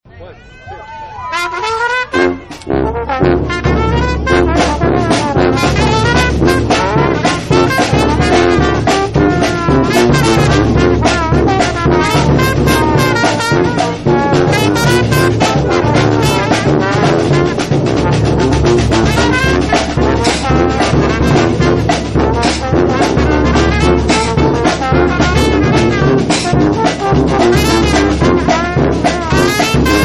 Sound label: Jazz Trio dixie